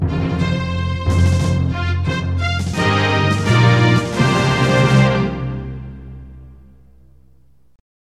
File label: fanfare; NFL screensaver